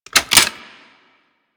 Clip Insert, Rifles; Snipetron

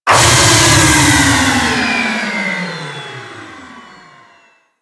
Stealth Movement Wind Down; Warframe; Vehicles; Archwing Stealth

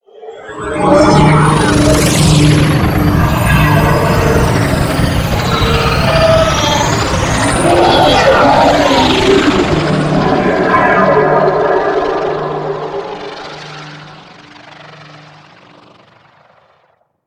Insect Liset Relay Undocking; Liset Flight

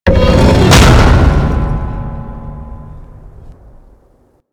Moon Bolder Pot Tip; Ambience; Warframe; Orokin Moon

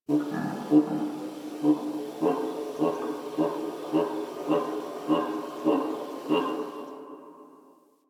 Creature, Ambience; One Offs; Creatures; Warframe; Quests; The New War; Drifter Camp

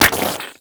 Chem Strike Nox Rifle Projectile Impact

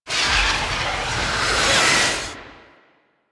Void Sink Pre Appear; Warframe; Void Storm; Railjack

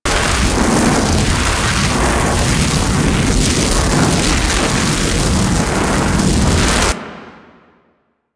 Fire Ball Projectile Loop; Warframe; Sargas Ruk

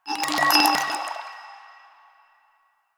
Vial Foley; Warframe; Lasrian; Lasrian Soldiers; Footsteps; Lasrian Goonadier

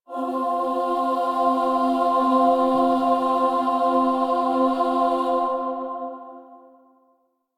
Requiem Mod Choir; Warframe; Kuva Lich

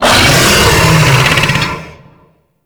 Infested Bone Blade Vocal; Warframe

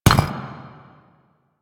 Footstep; Warframe; Warframes; Qorvex

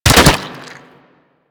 Infested Devourer Swing Hit C; Warframe

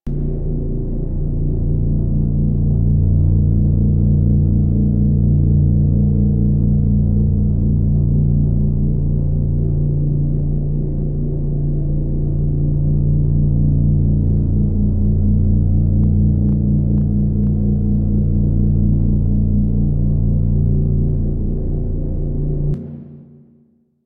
Orbiter Engine Loop; Ambience; Warframe; The War Within

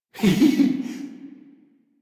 Laugh; Warframe; Alad V Boss; en

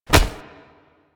Hoverboard Grab; Warframe; Vehicles; Venus; anim sounds

